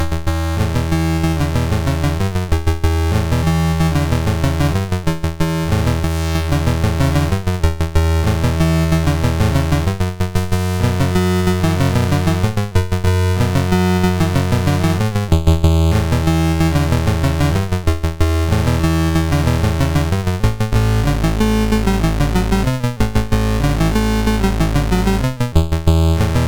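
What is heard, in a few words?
Magicians Ball, MOS 6581 SID chip music, games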